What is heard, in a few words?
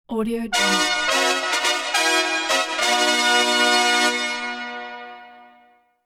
fanfare